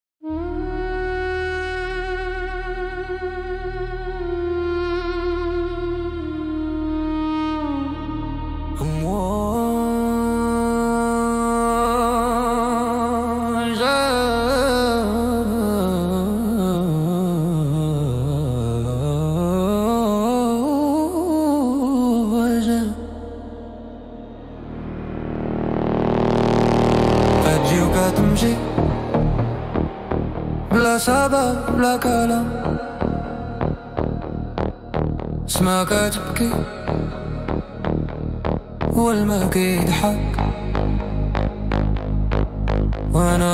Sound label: Mystical Arabic Techno House